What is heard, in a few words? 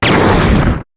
star hit; tf2ware ultimate; mariokart